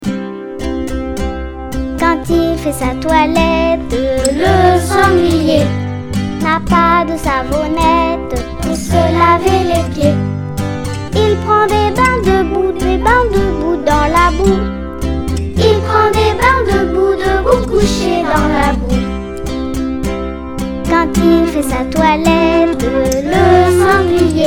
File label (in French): Chanson Couché dans la boue